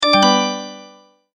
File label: multimedia tone, Goodbye